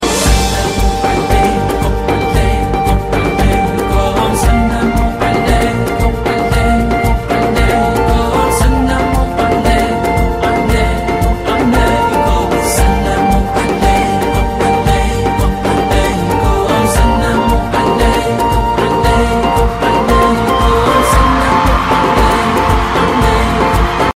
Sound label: islamic tone; ringtones